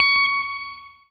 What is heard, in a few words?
ROCK, Guitar